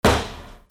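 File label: percussion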